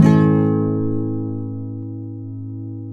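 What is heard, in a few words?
nylon guitar down major A#, flam chords; Spectrasonics; Hans Zimmer Guitars VOL-2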